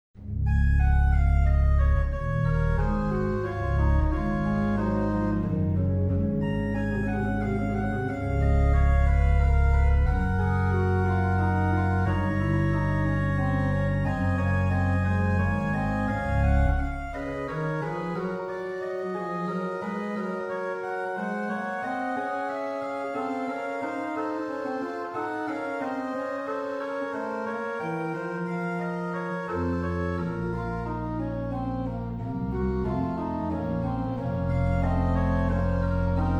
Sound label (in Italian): organo; BWV 567